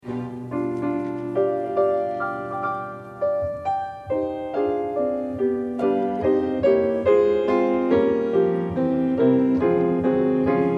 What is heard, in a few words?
orch